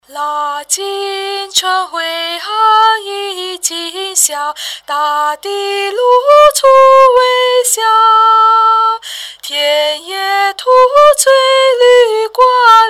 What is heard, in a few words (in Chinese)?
腊尽春回歌演唱